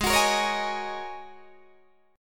G sharp 7th Suspended 2nd Suspended 4th G sharp Guitar Open D